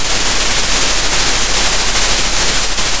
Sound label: pop, Sound of a thunderstorm